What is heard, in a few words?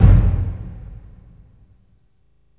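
orchestral bass FFF; InVision Interactive; Lightware VOL-1; Instruments & Percussions; ORCH.PERC.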